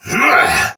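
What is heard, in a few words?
Exertion Soldier Sword Agent Male, Battle; Sinecure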